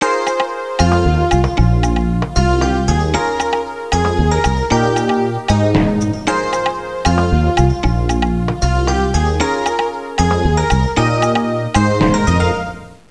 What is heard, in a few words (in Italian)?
suonerie contemporanea; musica contemporary